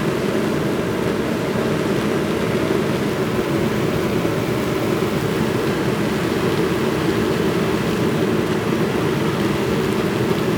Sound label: ambience